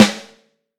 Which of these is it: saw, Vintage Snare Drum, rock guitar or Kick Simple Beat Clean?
Vintage Snare Drum